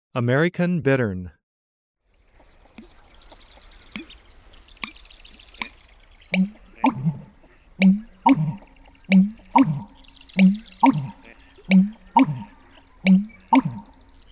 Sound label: american bittern least bittern